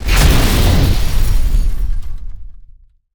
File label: Fire Ignite Large; Destruction